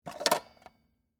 Telephone Receiver, Technology, Telephone, Receiver, Pick, Up, Wall, Phone, Antique